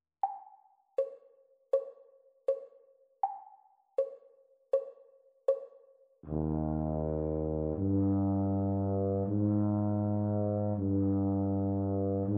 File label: Eb tuba duet exam speed; Grade 1